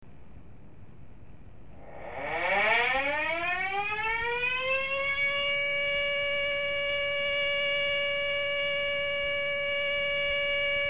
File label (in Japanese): sairen, dam; hitokura